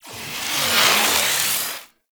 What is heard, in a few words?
Parasitic Capsule Leech; Warframe; Entrati; Conquest